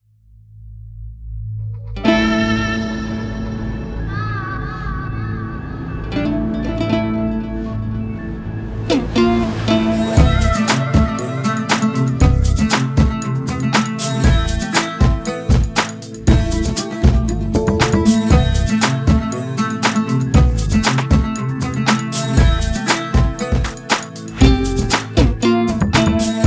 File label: Ethnic World Strings; rare stringed ins; Thaloops